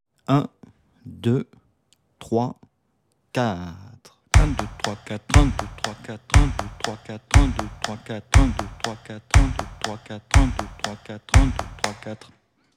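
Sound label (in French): rythme 060 bpm, guitare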